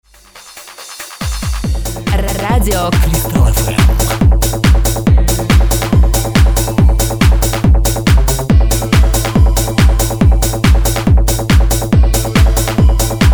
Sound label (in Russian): Джингл Techno Mix